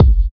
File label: clear kick sound a key, Royality free kick drum single hit tuned to the A note; kick drum samples